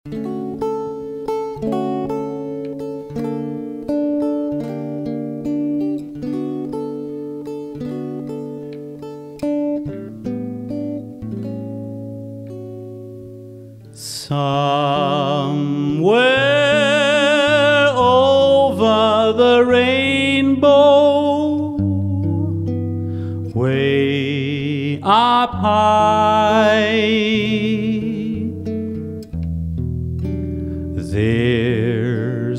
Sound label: Jazz Lounge voc